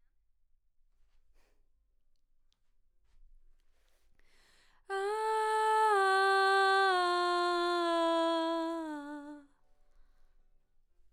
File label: BG Vox